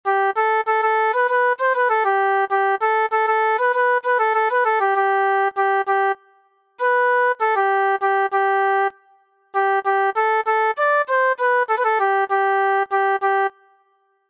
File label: Flute